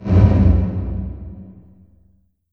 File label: LH LT909, REVRB LARGE HALL; TR 909